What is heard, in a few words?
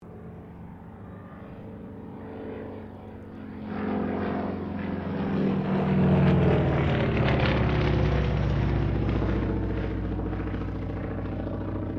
AIRCRAFT CAC WIRRAWAY LOOP; CA-16 Wirraway; Airplanes; Vehicles